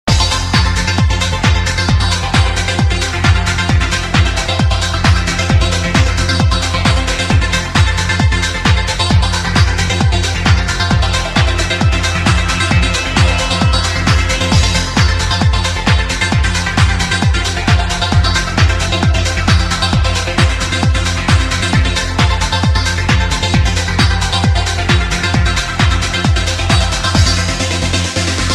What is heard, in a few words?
Indian Trance